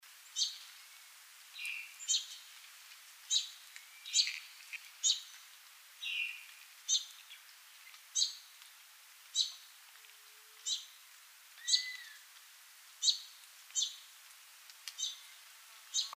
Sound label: Magnolia Warbler, Setophaga magnolia